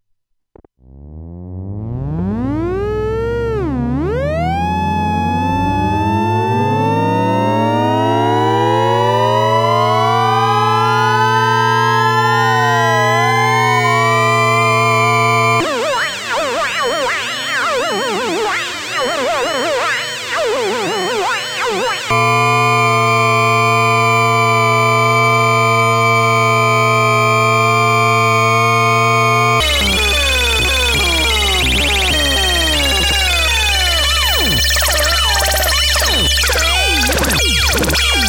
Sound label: Theremin